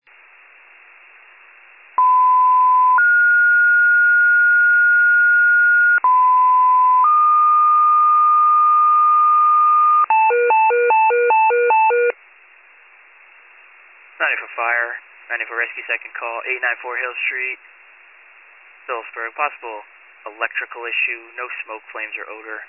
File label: FIRE pager alert